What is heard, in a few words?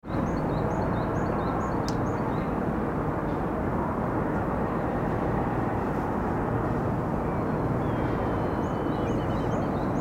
city long